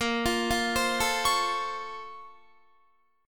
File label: Bb Minor Major 9th, Guitar; arpeggiated; 4F,4A,5C,5G,5C,6E